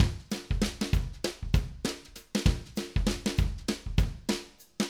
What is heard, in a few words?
Acoustic Live Drum